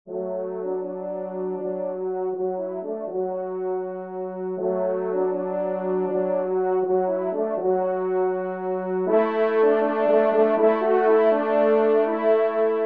Echos Champetres TRP; Viney; 3e Trompe

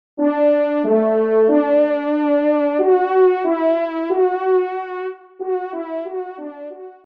Clocher; Dampierre; Loir Cher; Indre Loire

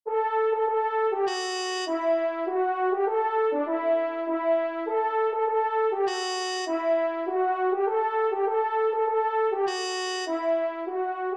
Tambourin Do Maj; 3e Tambourin; Corrette

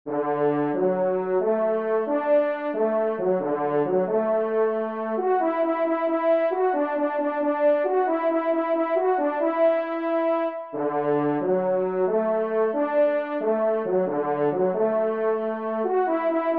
pas redouble, trompe; La Bidart

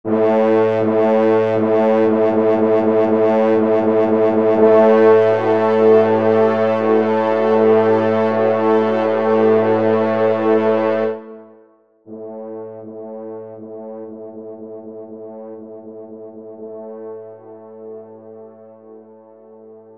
trompe, Basse; Chasseur Noir

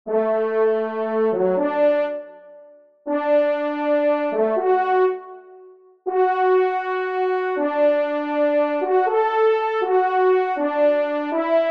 Trompes; Le Rendez-Vous de Chasse; G. Rossini; Trompes et/ou Cors en Ré et Piano

